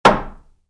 Wood hit wood, Wood, Light Impact: Wood; Materials; Stuart Duffield

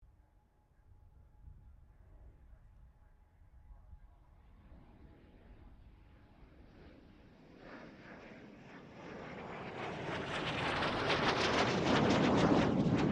AIRCRAFT JET PROVOST T2 PASS; BAC Jet Provost; Airplanes; Vehicles

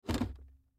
Chevy Cavalier 2006 Gear Shift Down; Cars

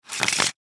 Cards Shuffle; Cards Bridge Sloppy Shuffle; Casinos; Sports

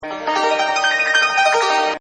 Scales, Musical; Miscellaneous Musical

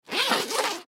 Violin Case Unzip, Violin Case Unzip Open; String; Blastwave

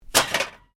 Can Softdrink Rubbish

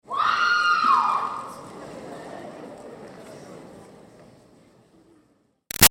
Crowd Teen Grad Scream Girls; Graduation; Reaction; BigRoomSound

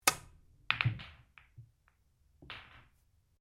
Billiards Cue Ball Pocket, Home billiards table; Pool; Sports

